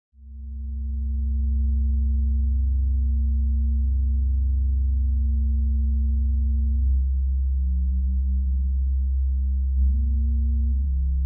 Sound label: lullaby